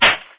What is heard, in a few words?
Chain